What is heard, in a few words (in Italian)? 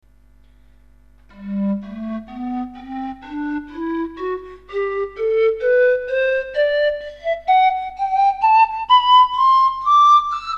gran basso